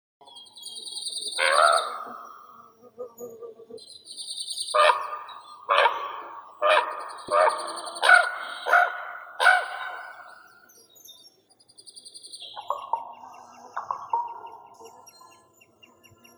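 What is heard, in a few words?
common raven, Corvus corax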